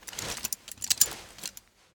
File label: Hold Sound